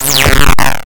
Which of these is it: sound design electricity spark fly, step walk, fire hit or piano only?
sound design electricity spark fly